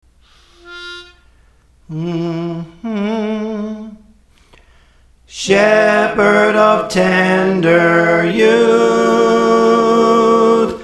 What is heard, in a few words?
Shepherd of Tender Youth, Soprano; Praise For The Lord Training